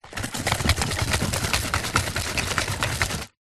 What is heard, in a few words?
food chocolate box small empty just wrappers shake; zapsplat